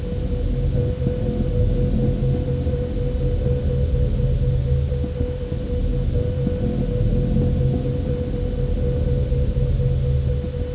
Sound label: mecha